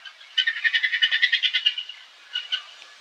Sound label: Birds Various, Birds